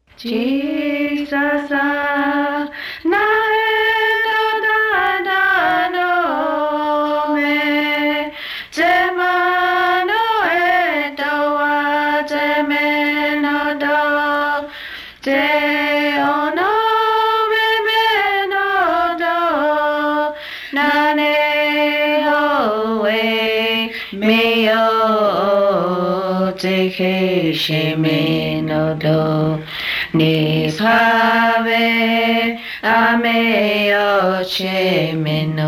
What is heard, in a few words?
hymnbook songs, cheyenne language; Jesus Nahetotaetanome